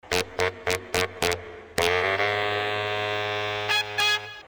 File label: Horns